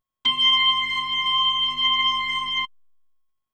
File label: B3 Organ; Masterbits CLIMAX 8